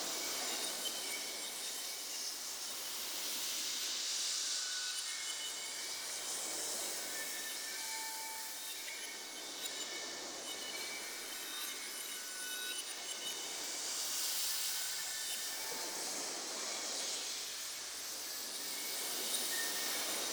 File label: Energy Restore Spirit Loop; Objects